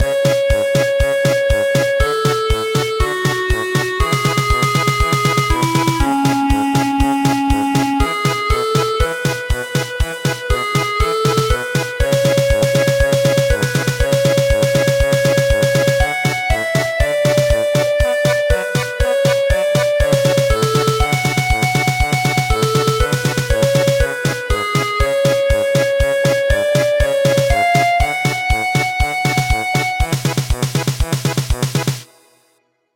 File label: German Polka